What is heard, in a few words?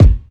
puffy kick drum single shot g key, Royality free kick drum single shot tuned to the G note. Loudest frequency: 136Hz